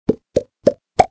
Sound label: Open Bottle, notifications; Itel it1406